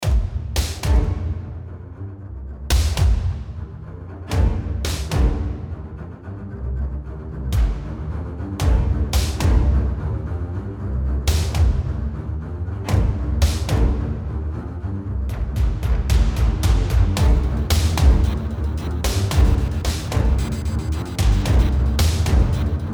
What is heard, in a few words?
Lomoto Perpetuo Loop, Cinematic